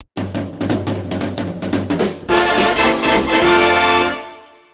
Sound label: Big band; 6670